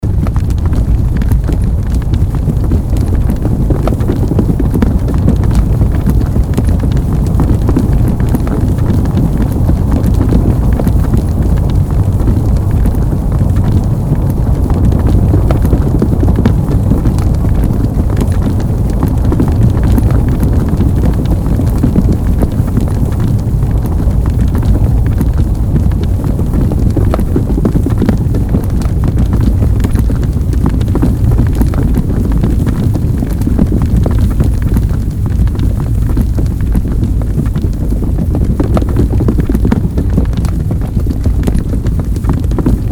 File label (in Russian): Tabun